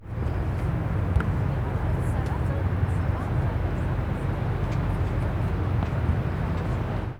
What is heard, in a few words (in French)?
vent